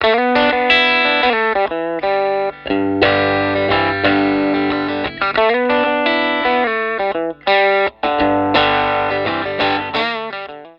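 STRAT BRIDGE WARM DRIVE OVERDRIVE LICK, Bridge pickup through a 1950 Fender Deluxe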